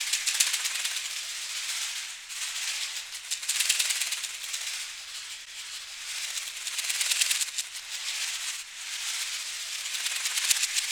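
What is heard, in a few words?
African shaker FX; Roland LCDP14 Africa VOL-2; Perc FX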